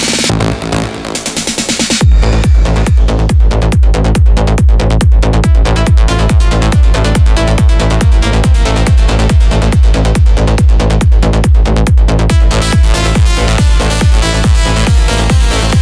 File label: TRANCE EVENT